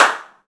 Clap Sound E Key, clap tuned to the E note. Loudest frequency: 1961Hz; percussion; single hits one shots